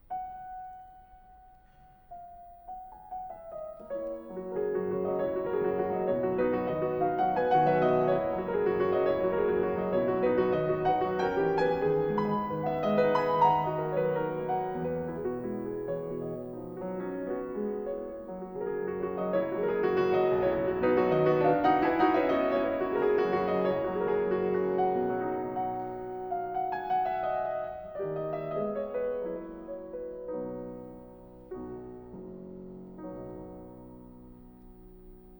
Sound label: Preludes, In B Major, Piano; International Fryderik Chopin Piano Competition